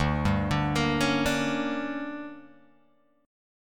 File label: D Major 7th Suspended 4th Sharp 5th, Guitar Open G